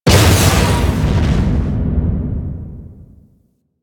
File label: Asteroid Thruster Explosion; Warframe; Railjack